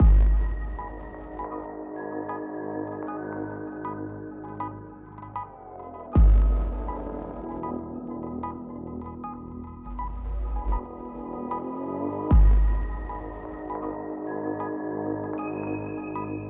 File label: Stream Loops